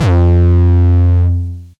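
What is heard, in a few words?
808 distorted, F1, kicks; Mbase 11 Kicks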